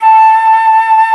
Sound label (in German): FLOTE, Alpine Volksmusik; Best Service